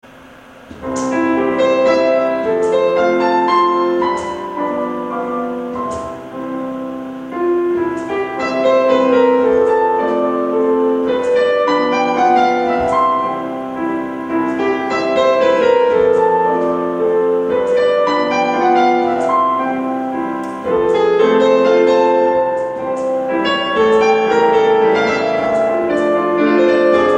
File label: noodling on the faux brahms, piano; faux brahms from star trek ep where spock shows his piano chops